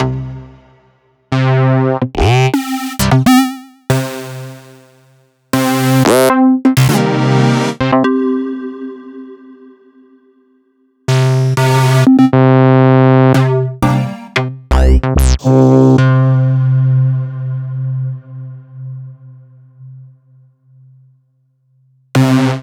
Electronisounds Synths; Sliced

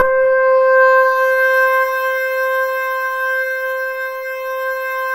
PHA, synthesizer bass; Synthesizers; Soli-Music; World of Synthesizers VOL-1

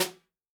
snare drum, jungle snare chromatic; Best Service ProSamples vol.43; Real Drum Kits

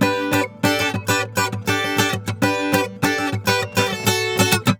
GUITAR SAMBA

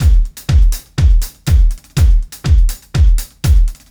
Straight Kit, World Pop Beats

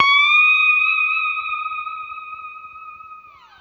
Guitar & Bass; Quantum Leap

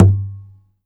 BODY, guitars; Spectrasonics; Hans Zimmer Guitars VOL-2; FNGSTYLE PFM

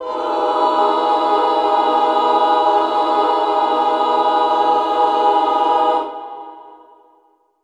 Cluster female, Classical Choir; Best Service; Extended Classical Choir

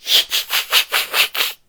Mouth Fx, Vocal

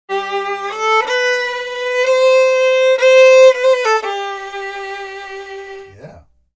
Fiddle, Appalachian

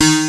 Prophet Five BASS D#3; E-MU Formula 4000 Series Vol. 3; Analog Odyssey

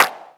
clap, drum machines; 300 Drum Machines; E-MU Orbit-3

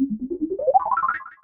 Bubbly, Synth FX; musicradar; cartoon caper

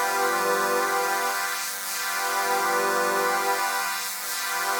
Pad Loop G, shimmer and sparkle

